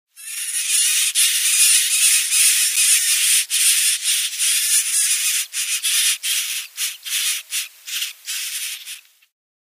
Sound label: Reed Warbler nestling